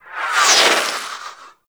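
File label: Super Weapon Missile Projectile By; Warframe; RailJack; Proc Ships; Corpus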